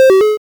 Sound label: ui Buy; ludum dare 48